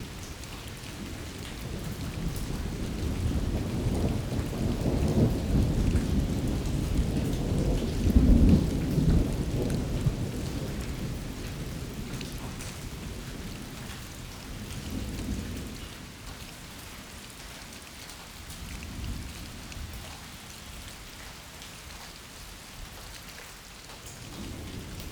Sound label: Rain Loop Thundery